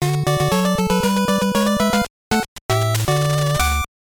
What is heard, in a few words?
Boss Clear; Wario's Woods